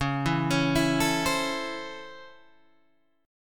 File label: C sharp Minor Major 7th Sharp 5th C sharp Guitar Open G